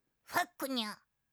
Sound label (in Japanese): ポップアップウィンドウのブロック